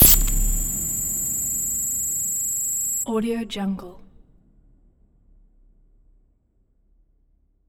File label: high pitched banshee accents; sinematic 2; soundmorph